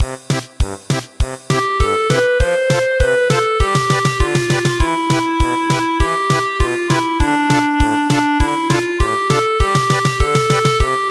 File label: German Polka; Good evening Kings